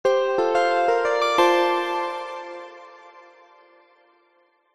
musical ident signature soft calm warm sincere bright